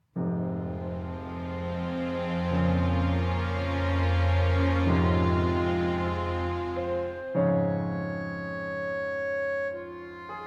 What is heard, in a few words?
church attack end; Enemy Front